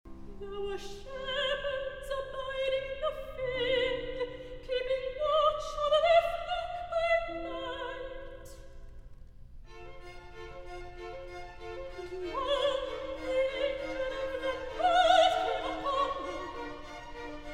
Recitative There Were Shepherds Abiding In The Field